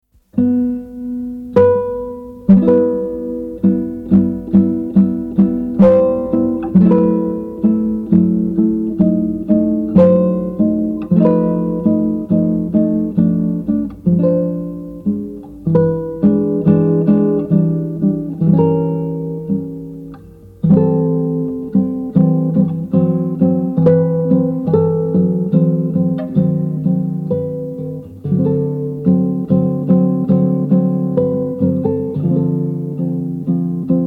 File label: Prelude in e minor